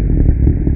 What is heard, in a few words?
Moving Block short; Giza